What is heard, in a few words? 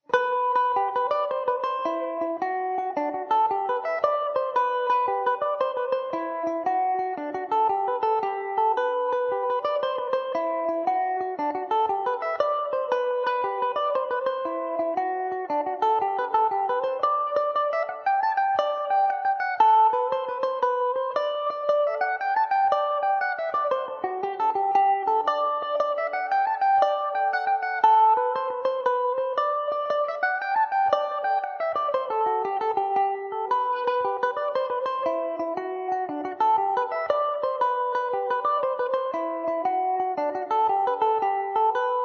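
jig G major mandobird, irish mandolin; Peggy’s Jig; Epiphone Mandobird VIII electric mandolin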